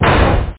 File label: enemy hit